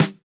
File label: snare samples, Rock